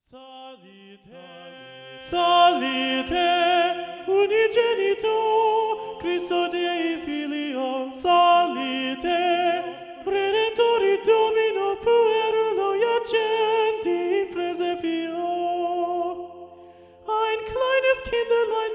psalite unigenito singt und klingt soprano predominant, schola